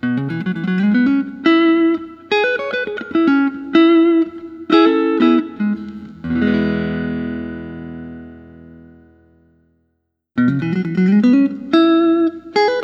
dpergo vintage limited tobacco

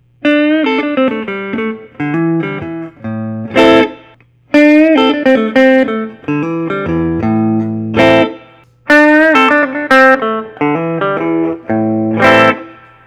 fender custom shop

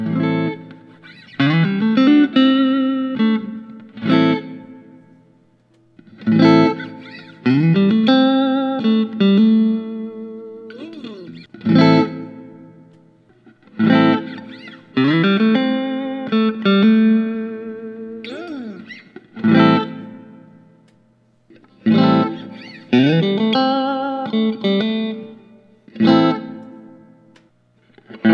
suhr classic s surf green

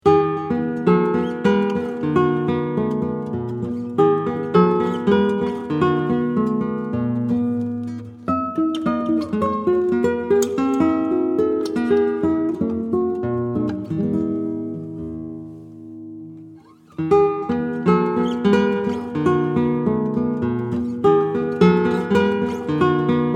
paper mache lagrim

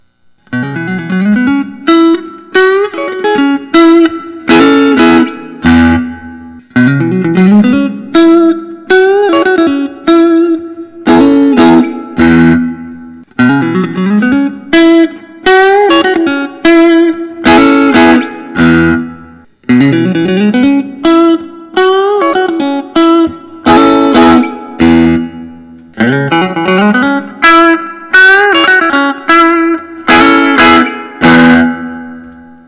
collings 360 st one piece ash

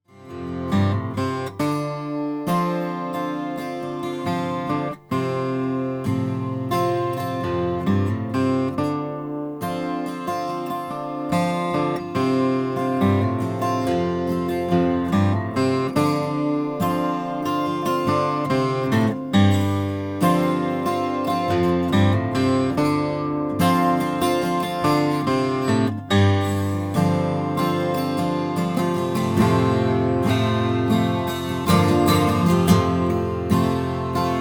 flammang gc30 12 mahogany

